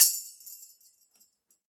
TAMBOURINE; Ableton; Factory Packs; Drum Booth

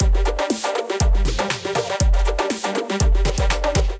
Big Room Kit, Drums; Ableton; Build and Drop

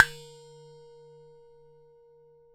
Pot cover, Household; Convolution; NI Kontakt 5

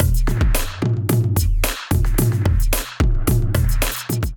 Kit groove 110 bpm; Kindle Kit groove 02 110 bpm; Ableton; Factory Packs; Drum Essentials

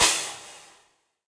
Crash FX 18o16 Ambient Loud Punch, Cymbal; Drums; Drum Hits; Standard Hits; Session Drums Studio

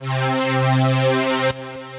Hybrid Duck Pad, Synth Pad; Ableton; Factory Packs; Build and Drop

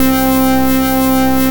Slip Funktion, Synth Lead; Bass; Ableton; Creative Extensions

